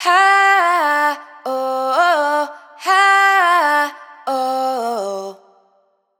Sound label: riff Ha Woah Breathy 85 Gm wet, Vocal; Slate Digital Omnivox Vocal Sample Pack